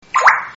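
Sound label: "water", parrot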